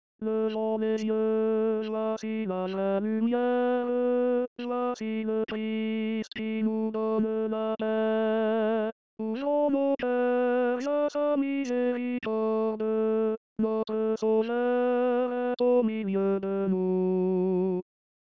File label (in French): tenor